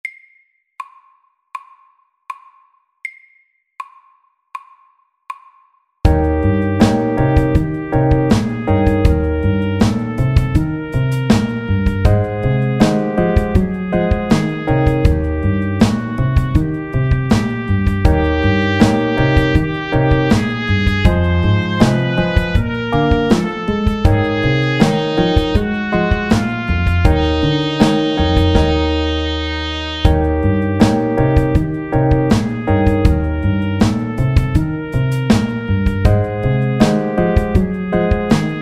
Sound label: Chromatic blues SAKTE